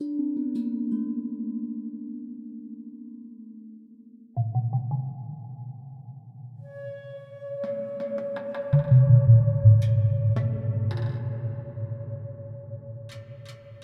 Cave Addon; Toki Tori 2

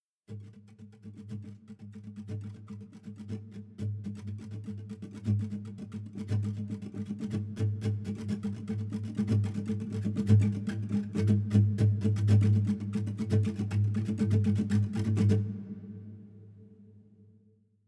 Savannah Music

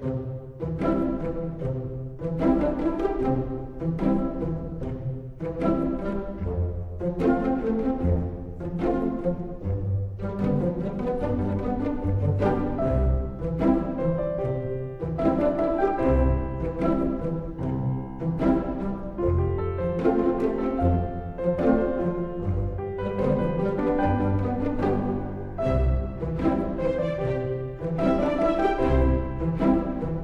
Casual Theme Looped; Dual Blade: Battle of the Female Ninja

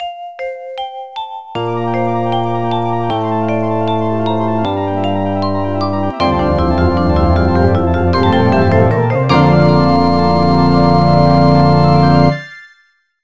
BGM Yellow road

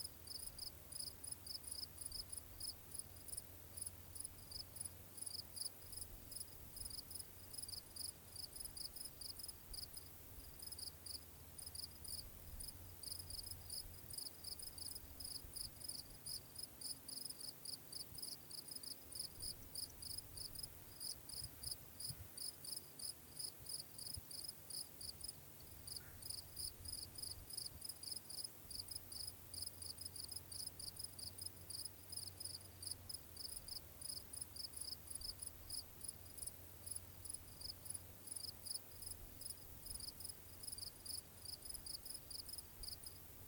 ambient night; Lantern Forge

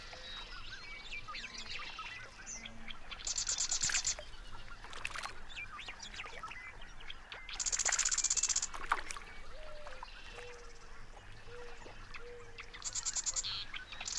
Atmosphere Lake Loop Day

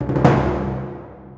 Timp rollin; Torchlight III; PS4

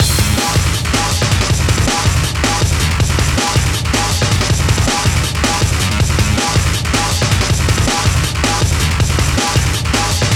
Bgm Loop; assault spy windows gamerip 2018

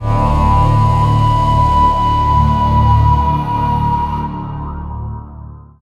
Sting; Mass Effect; Wards

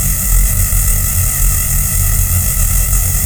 Laser Beam Loop; blastmorph pinball; xbox series xs

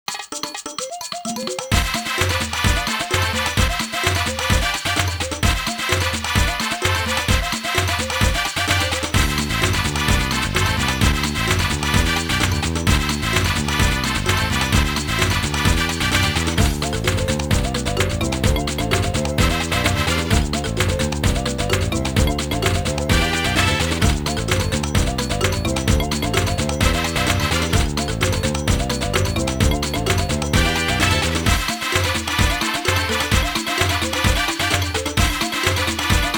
Football Samba; Pocket Fighter Remix; Capcom Sports Club

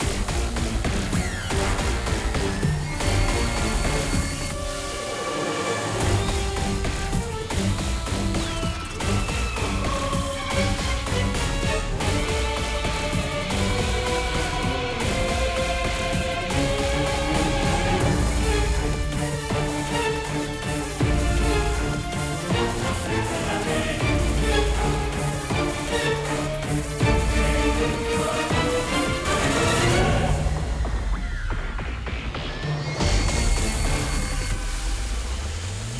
Battle Boss Giant Arachne

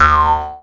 boing, water balloon

